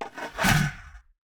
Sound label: fallen strike